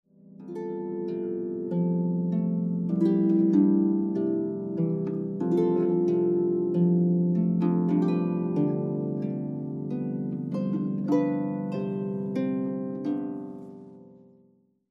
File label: Canon in D, harpist